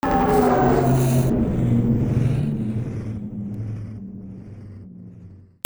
SCIFI

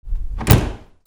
DOORS

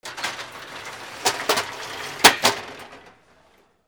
INDUSTRY

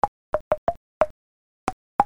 CARTOON

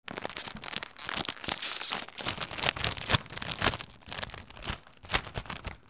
KITCHEN

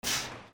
VEHICLES

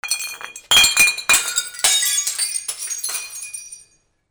BREAKING

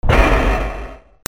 BANGS

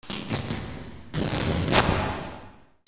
RIPPING

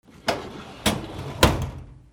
OFFICE

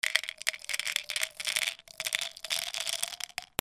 BATHROOM

